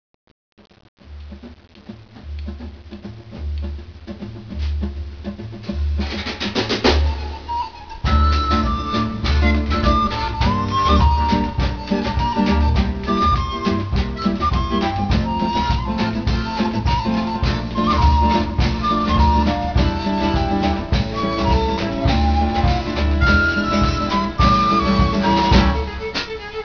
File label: Rumba; Zumba